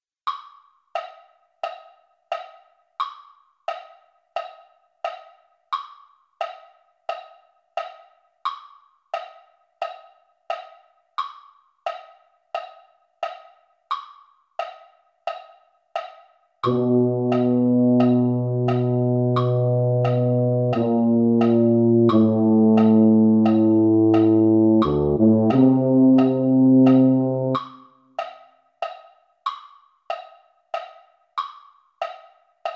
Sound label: tuba; holiday brass